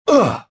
land

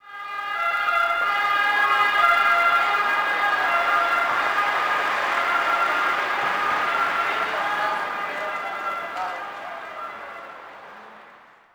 Siren; ambience; Project Gotham Racing 2

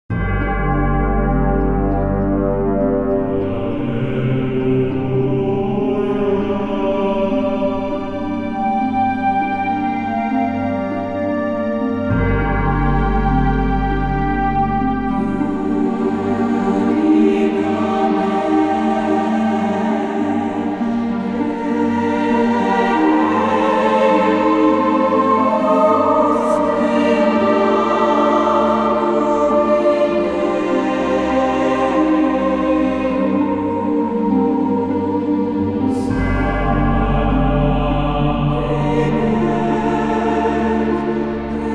Church Ambient; music; The Da Vinci Code; XBOX

